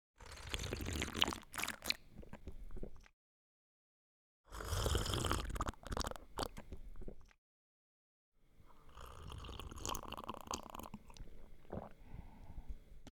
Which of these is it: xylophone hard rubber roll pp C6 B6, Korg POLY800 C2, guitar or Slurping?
Slurping